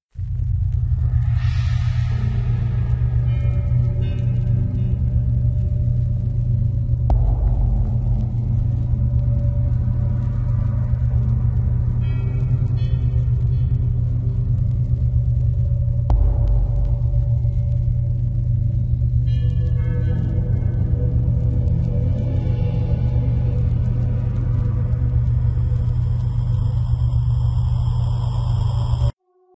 music

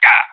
whack smash; cstrike; 35hp ruso

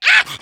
spitter attack

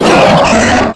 pain; jailbreak

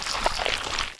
tentacles capture

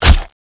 wind knife hit

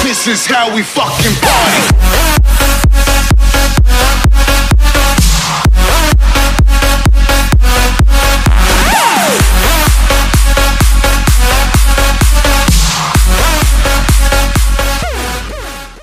electro; roundsound

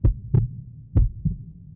heart; cstrike; D26 slender

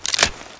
close

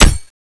wind knife hit wall

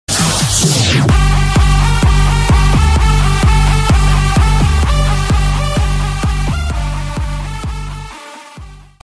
round sound

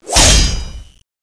knife hit wall

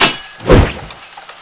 knife slash; spknife

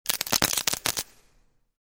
c4 spark

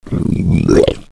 boomer idle, zombie idle

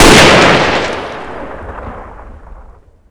sound sniper awp; cstrike; ZP CRISTIAN

